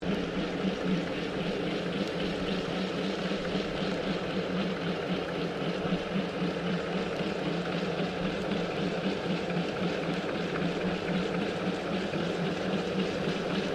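Boat Custom Restaurant Boat Large Engine Manoeuvre Submerged; large resteraunt boat; Boats; Vehicles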